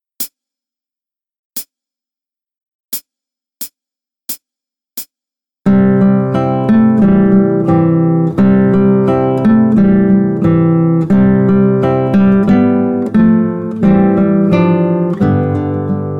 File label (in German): trad kolumbien